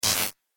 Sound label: Laser Static; Laser Sound; Multimedia